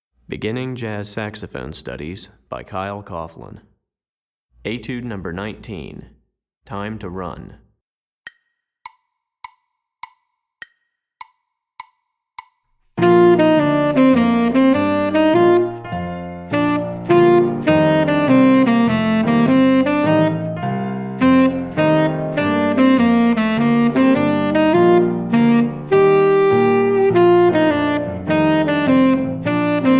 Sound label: Tenor Etude, Saxophone; Etude #19; Recordings For Beginning Jazz Saxophone Studies for Tenor, Volume 2; Running Eighth Notes; Kyle Coughlin